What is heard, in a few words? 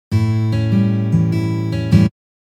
Blues Guitar Fingerpicking Pattern